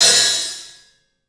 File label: HAT, CLUBHAT, 3D Drums; 3D Sound